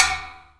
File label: Real Closed Hats